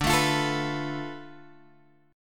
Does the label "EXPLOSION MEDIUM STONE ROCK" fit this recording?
No